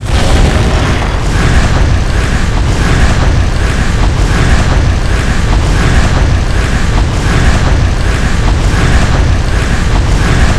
tornado

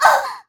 Hit Voice

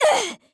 DMG

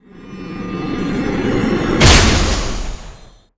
turbidity air cast

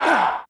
Death

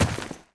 jump

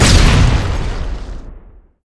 goblin bomber

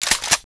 Weapons Gun Ready

